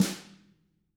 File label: HIT, Drums